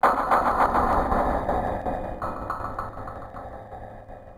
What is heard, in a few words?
BREATH